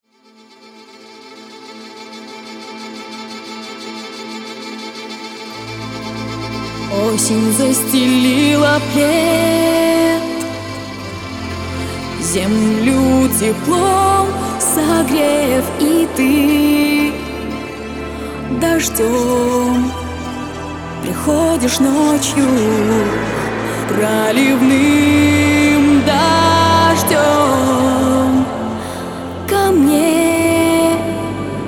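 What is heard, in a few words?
Rain sounds; Zvuki Dozhdya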